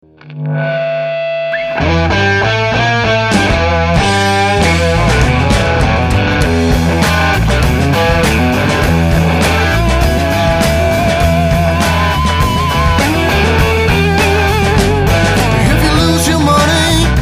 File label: blues